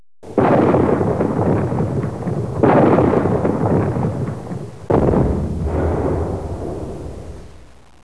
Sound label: SEA; American Civil War; Interactive Magic 1997